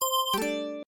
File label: hint answer effect